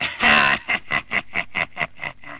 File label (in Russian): smeh dedka